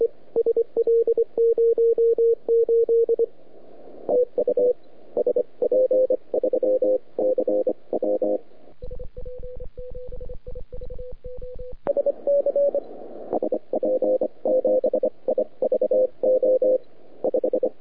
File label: CW, 3,5MHz; SP3CW de SP7IVO; euday 2017